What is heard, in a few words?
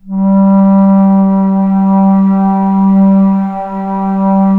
BASS 1 G1 L, bass flute, historical instruments; AKAI; USB Soundscan vol.23